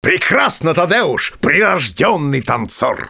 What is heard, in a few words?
Dance Ballroom